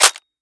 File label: slide forward, AR-10T